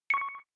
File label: Melody Pound; LG CT810 Incite